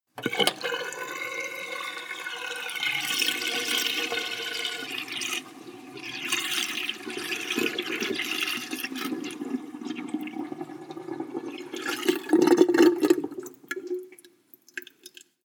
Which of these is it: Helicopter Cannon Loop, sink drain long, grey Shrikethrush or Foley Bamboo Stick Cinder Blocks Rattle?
sink drain long